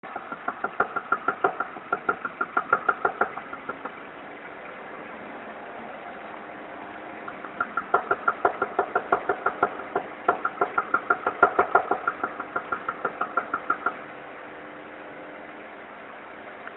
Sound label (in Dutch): backfire geluid in inlaatspruitstuk